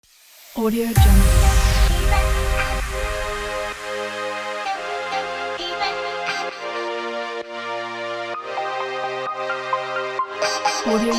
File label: melodic emotional romantic hiphop